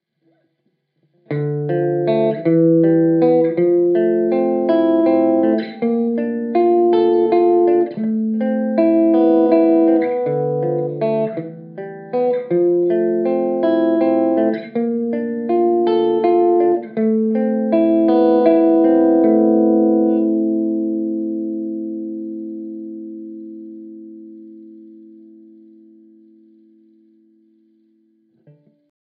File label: vintage